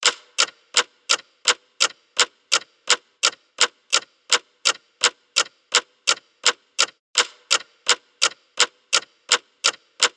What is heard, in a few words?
countdown; pinball